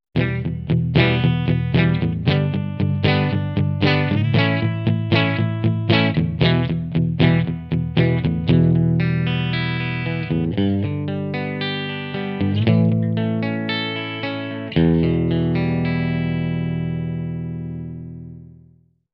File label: bypass; Maxon VJR9